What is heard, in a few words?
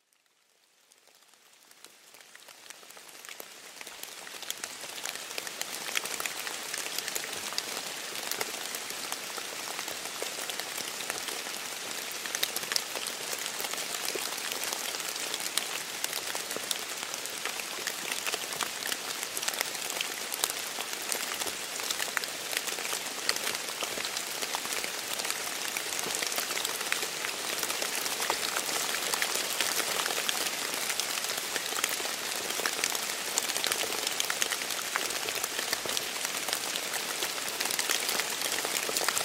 Forest Rain